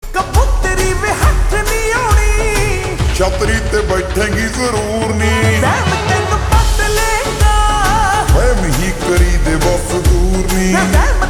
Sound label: Ringtone Slowed Reverb